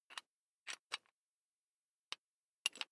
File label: electric drill bit fasten wrench apply